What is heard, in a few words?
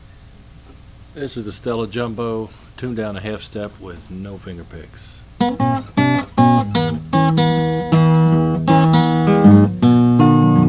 oscar schmidt stella jumbo; natura